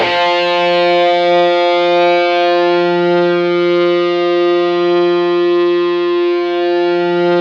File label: DIST GUIT, GIB SG, F3